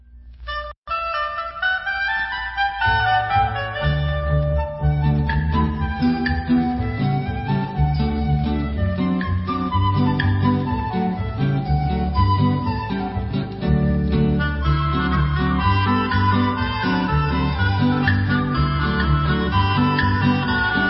Israeli Mixer, Instrumental

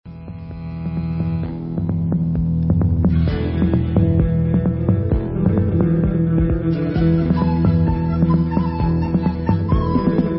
Debka Instrumental

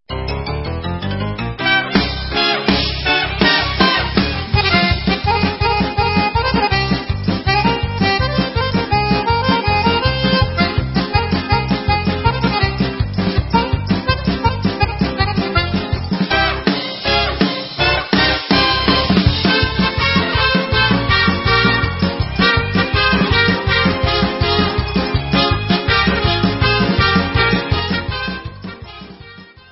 Hora, Instrumental

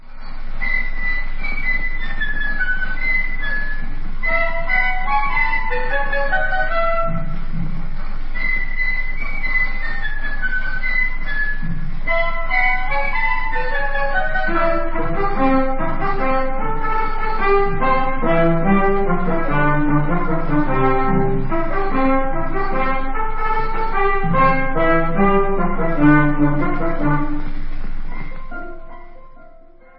instrumental

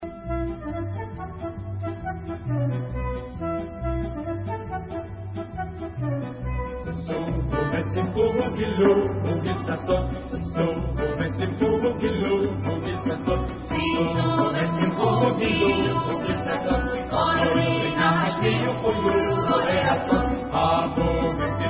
Vocal

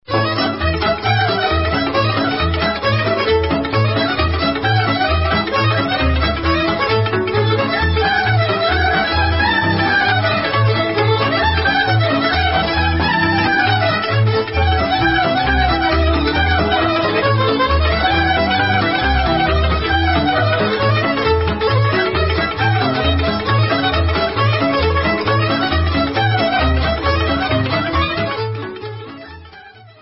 Romanian Oltenia; Alunelul Batut De La Goicea

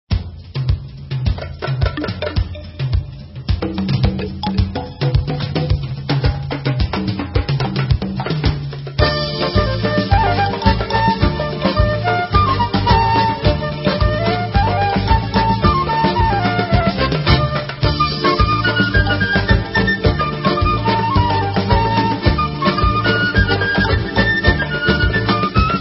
Instrumental

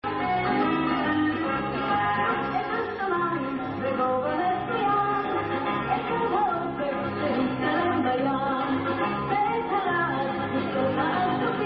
Tarantella

